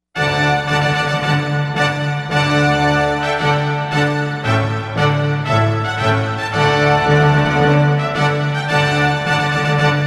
Intrada MIDI in D

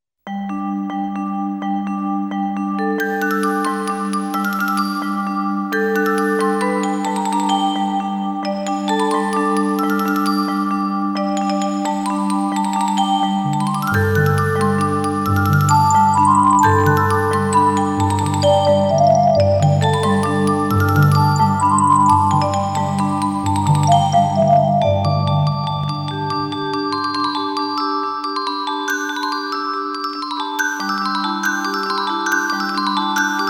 Bagpipe

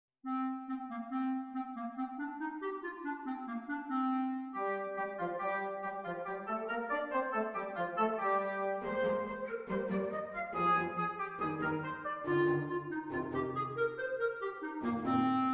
Slovak Lads Dance

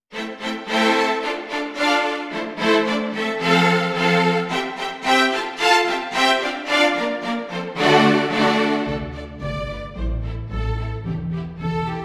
Czardas

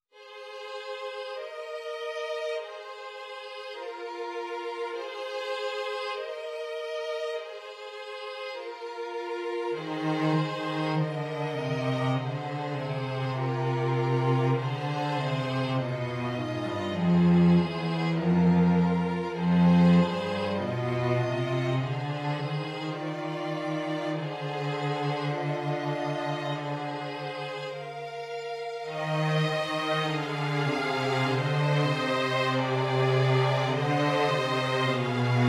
Dirge

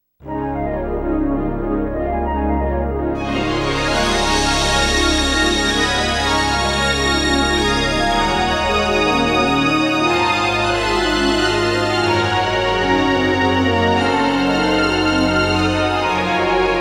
MIDI; GEMS II

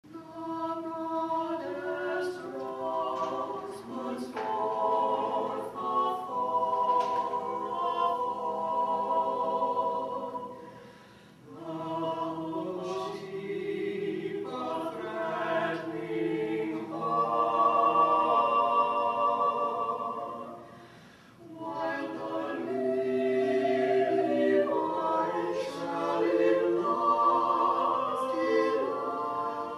Quartet reverb; Hong Kong Children's Choir 50th Anniversary Choral Selection Winner, 2018

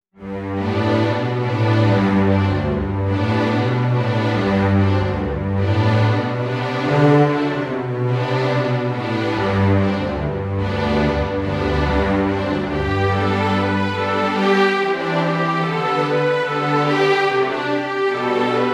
Song of the Bagpipe

